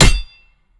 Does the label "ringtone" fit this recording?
No